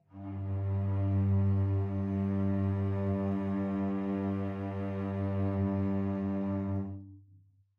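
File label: Cello Ensemble sustain vibrato G1; VSCO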